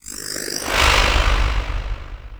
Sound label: Corruption Fracture Arms; Warframe; Zariman; Missions